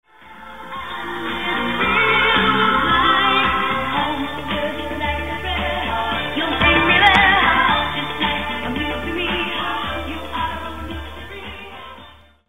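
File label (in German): Röhrenverst test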